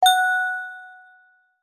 multimedia alert notification basic bell synth positive ping; zapsplat